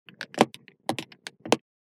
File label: wooden footsteps